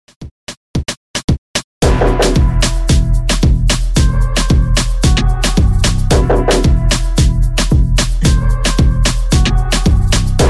Transition Redrum; Rossi

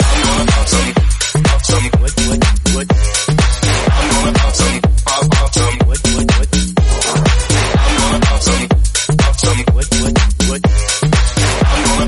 Tech House Snippet 124bpm Abm

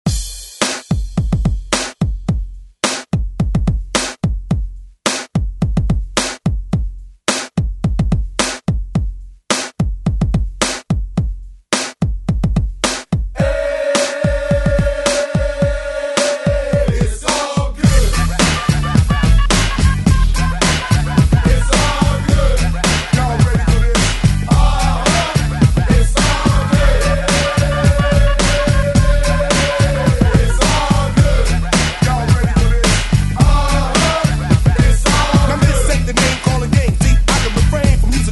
90s ReDrum

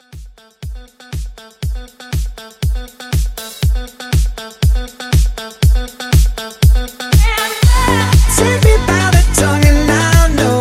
House Blend

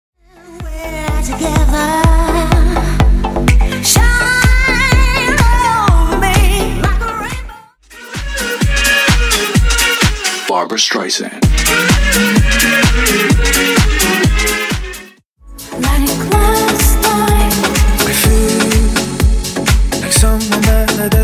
Dance House Throwback; 10 Edit Pack

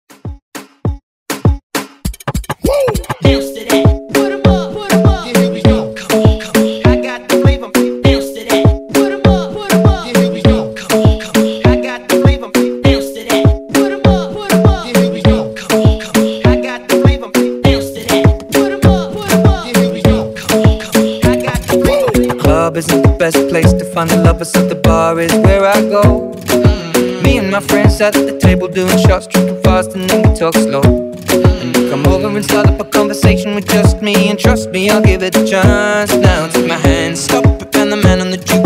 Moombahton; Redrum

